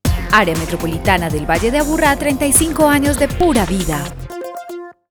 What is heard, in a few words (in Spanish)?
Logo Sonoro; AMVA; Nuevo